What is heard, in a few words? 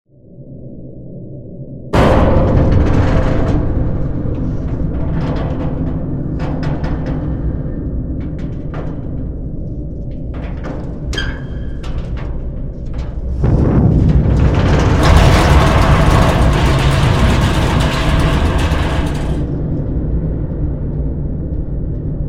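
catastrophe on a boat; Звуки подводной лодки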